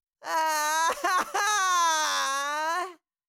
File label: Cartoon little child voice shout scream long